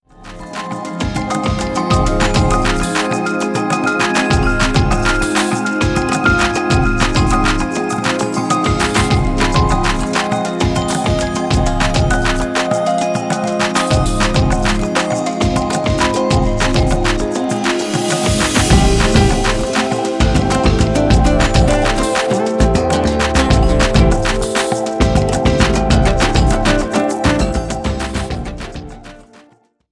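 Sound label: background music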